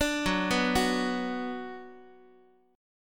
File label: F sharp Suspended 4th Sharp 5th, Guitar; arpeggiated; F#sus4#5